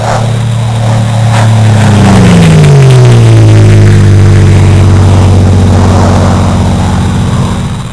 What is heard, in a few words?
air, ambience